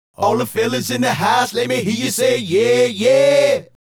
Male Group, Hip-Hop and RnB Vocals

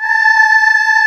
Choir; AKAI S-Series CD-ROM Sound Library VOL-2; CHO HARP

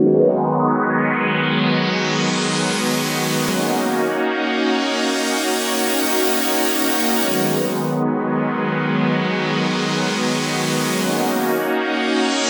Pad 129 BPM D#, Club Techno; Zero-G; Groove Loops

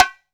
BONGO, ST BONGO; AKAI S-Series CD-ROM Sound Library VOL-5

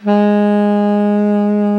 SOP SAX G#56, SOPRANO SAX; Northstar - New Gold VOL-1

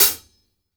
hit, hi-hats; Sampleheads; New York City Drumworks VOL-1

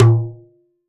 MIXED DRUMS, World Instruments; Best Service ProSamples vol.52 - World Instruments 2; AKAI

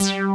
JS BASS; Pro-Rec - Synthsonic; BASSES

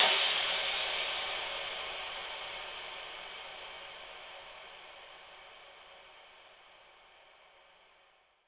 cymbal crash one shot reflection

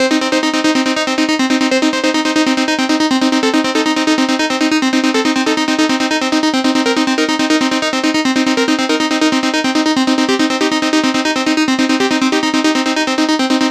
NRG Arp, trance

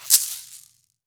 caxixi; Brazil

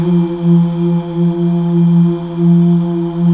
Mal Uuh; Best Service Gigapack 1

